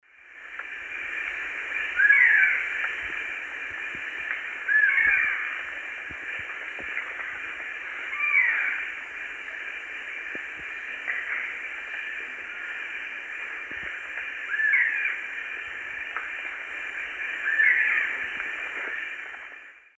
Myrmeciza melanoceps, call; S. America; Eastern Lowlands

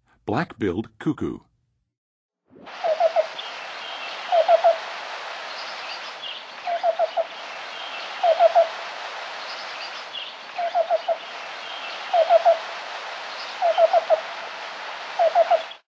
Black-billed Cuckoo, Songs of Birds; North America; Western USA; Western USA Songbirds

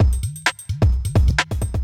electro kit 130

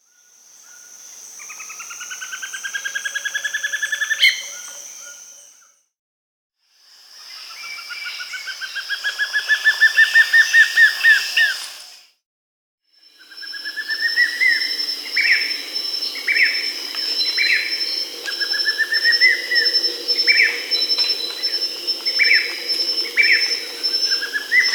Grayish Mourner (Rhytipterna simplex), Peru; S. America

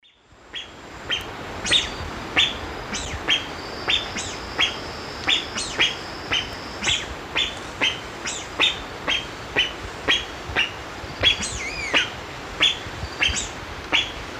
Mitu salvini, alarm calls; S. America; Eastern Lowlands

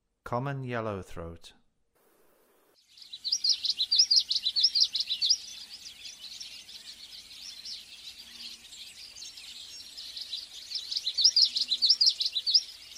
Common Yellowthroat; North America; Western USA; Western USA Songbirds

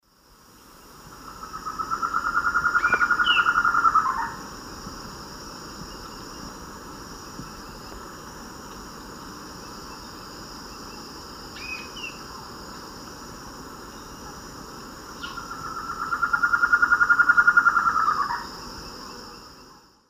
Thamnophilus amazonicus, song; S. America; Eastern Lowlands

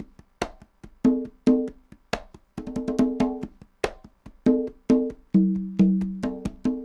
congas 140, latin, 140bpm; Sound Effect Library

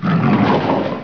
LION, animal; 动物

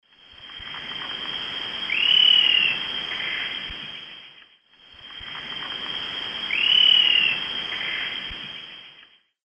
Lophotriccus vitiosus, calls; S. America; Eastern Lowlands

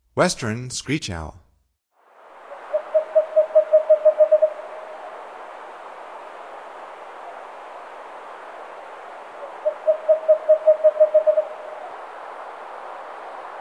Western Screech-Owl; North America; Stokes

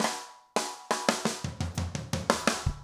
jungle 170 fill; Sound Effect Library

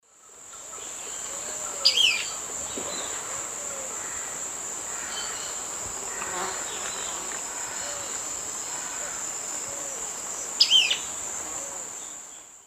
Cnemotriccus fuscatus, call; S. America; Eastern Lowlands

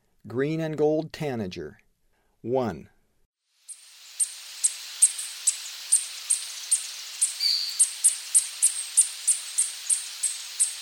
Green-and-gold Tanager; S. America; Eastern Lowlands

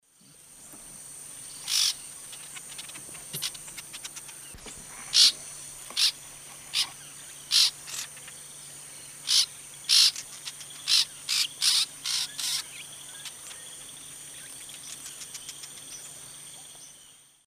Progne elegans, calls; S. America; Eastern Lowlands

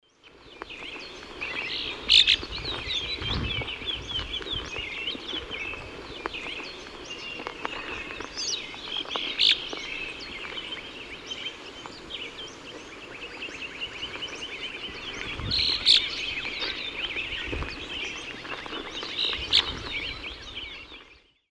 Elaenia gigas, chase calls 3 birds; S. America; Eastern Lowlands

